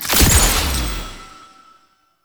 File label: Vendor Conquest Hard Mode Select, UI; Entrati